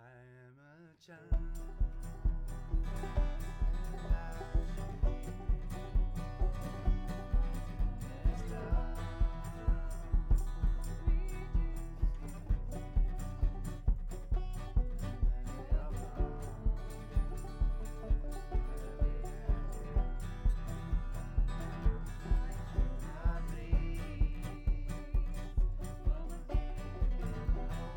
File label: Chordz